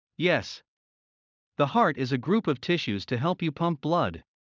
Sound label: Heart; Classroom humanbody